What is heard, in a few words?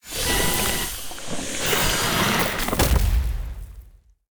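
boss death; Giardinia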